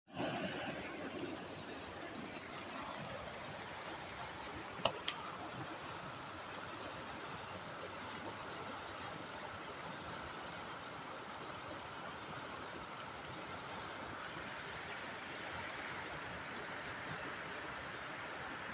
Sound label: falls sledding hill; berea